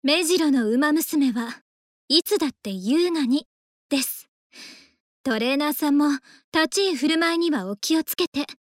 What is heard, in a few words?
applause; osu